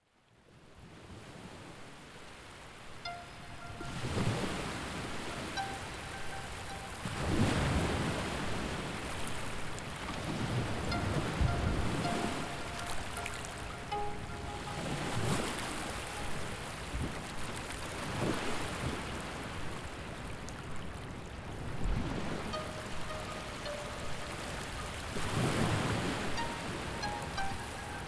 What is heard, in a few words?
ocean waves